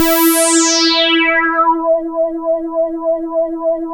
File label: JUNO SWEEP; E-MU Formula 4000 Series Vol. 3; Analog Odyssey